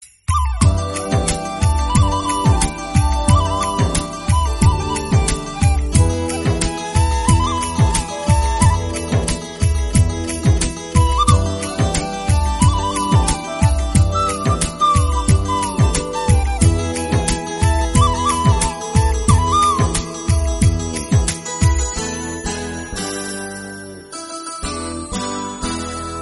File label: flute; Indian